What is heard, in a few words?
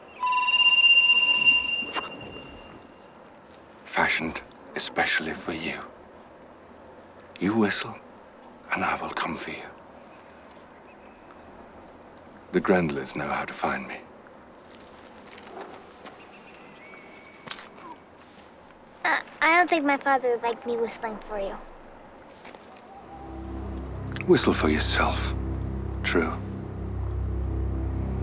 whistle; Life Lessons; whistle for yourself